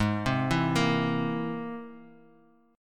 C Augmented 7th Ab

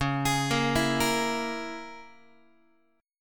Db Minor 6th Db down Guitar; Dbm6 arpeggiated; x,9,11,8,8,9; JGuitar

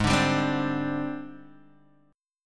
Ab Major 7th Flat 5th Ab, down, Guitar, Open G

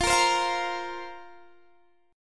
F Suspended 4th F down Guitar Half Step Down

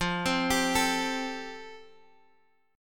F Major Add 9th, Guitar DADGAD

